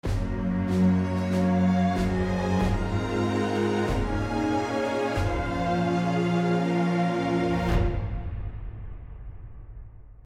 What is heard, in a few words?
String Suite; Kingdoms and Castles; Merchants and Ports